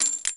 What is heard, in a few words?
Gold Reward; Live Realm